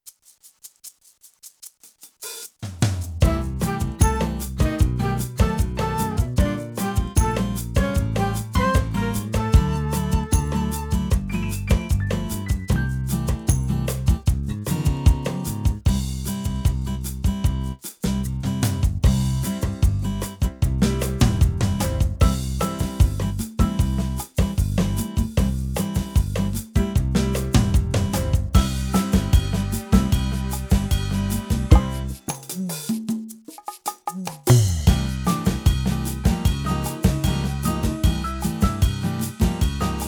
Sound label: sd1000 bossa